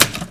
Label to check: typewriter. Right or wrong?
Right